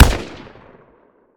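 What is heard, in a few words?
weapon ar fire player atmo exterior; gmod; viper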